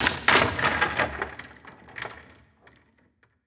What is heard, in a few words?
Textures Chains; Impulse Responses; Ableton Live 12 Lite; Hybrid